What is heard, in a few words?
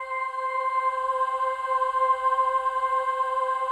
PAD, ATMO PADS; Best Service; XX Large